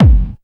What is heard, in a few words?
KICK hardcore, Techno Trance DRUMS